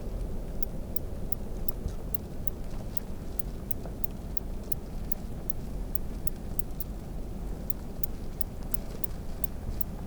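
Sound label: Grevillia tiny ticker, Western Australia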